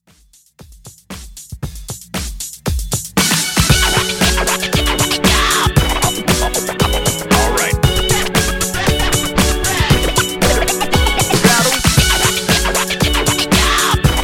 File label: Funk Scratch, 116 Bpm; Redrum